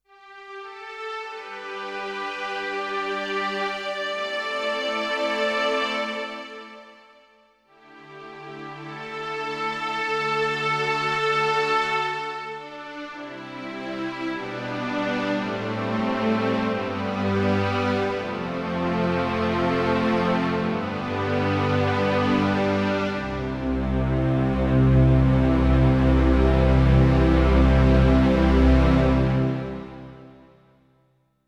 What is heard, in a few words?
Roland EA7 Strings